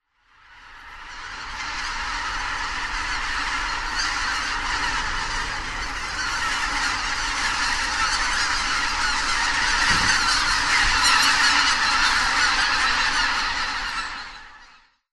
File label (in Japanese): magan, miyajimanuma, koe